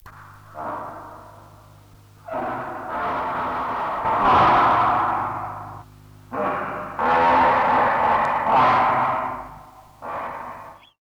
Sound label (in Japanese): kaba, カバ 「ブー、ブー」という連続した鳴き声; 上野動物園